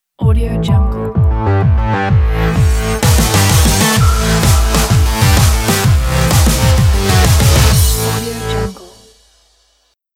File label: modern electronic logo pack; soundbrothers